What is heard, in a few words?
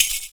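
PERC, percussions; Univers Sons - Raricussions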